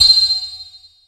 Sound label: IND; Zero G; Man Machine